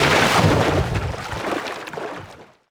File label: Missile Water After Splash; Destruction